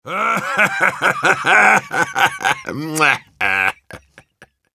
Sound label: Heavy laugh long, ru; Насмешки пулемётчика